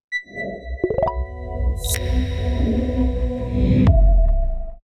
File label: logo; LMT